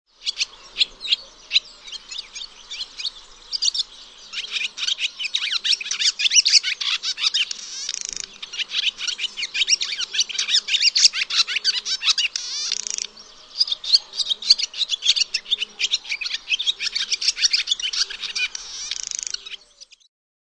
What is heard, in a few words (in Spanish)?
golondrina comun